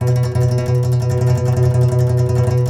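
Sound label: Contrabass Balalaika, Plucked Strings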